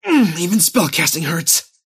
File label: cast pain; dota; kez